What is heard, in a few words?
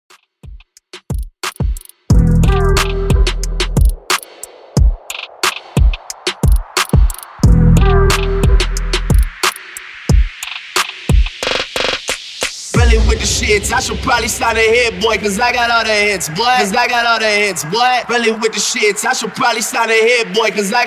Epic Intro